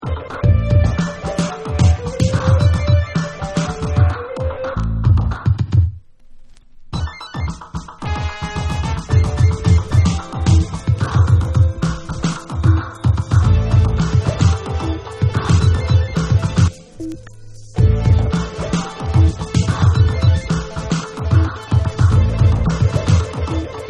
Instrumental

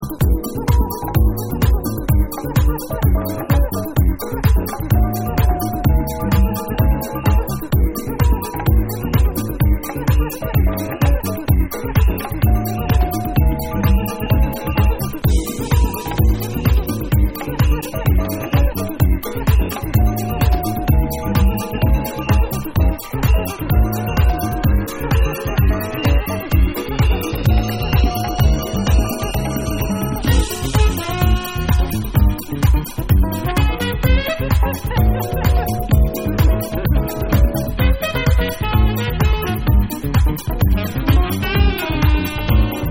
SAMBA

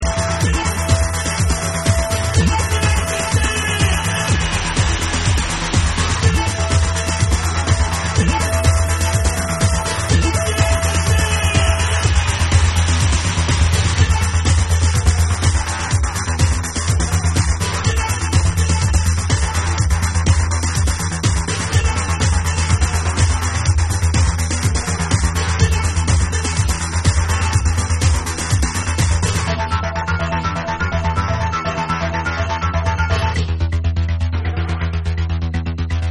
BEEP BEEP

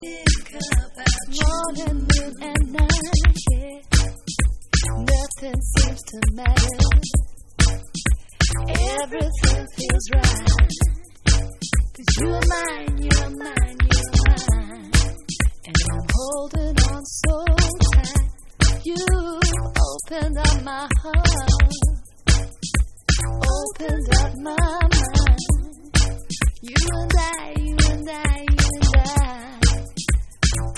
Vox Beats

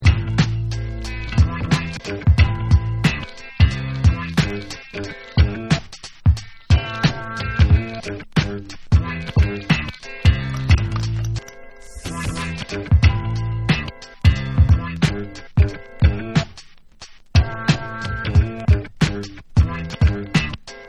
Instrumental

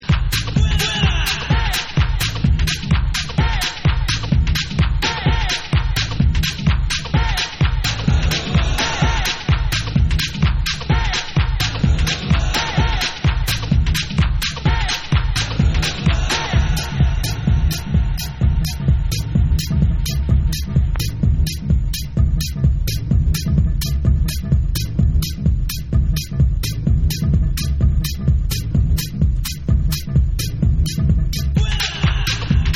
RHUMBA

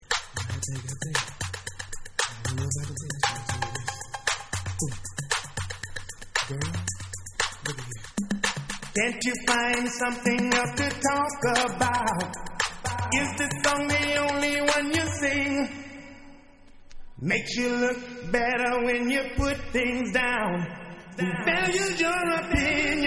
Acappella

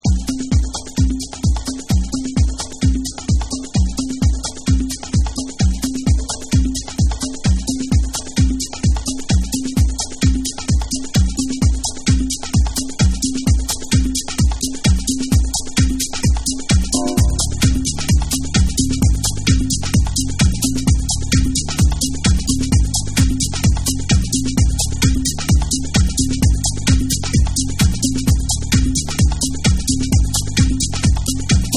A Deep Tribal Dub

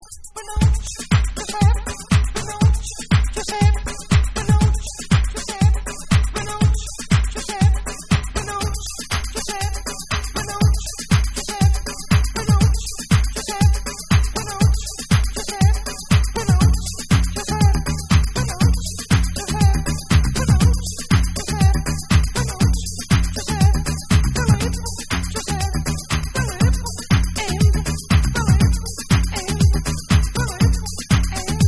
Bonus Beat

